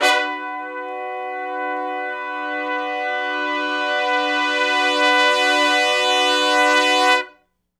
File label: 2 measure Long Note w Swell (B) minor, Horncraft; Sonic Foundry (Sony Creative Software); Sections